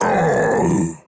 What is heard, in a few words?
Centaur Death